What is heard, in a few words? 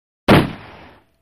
9mm Uzi 2 Single Shot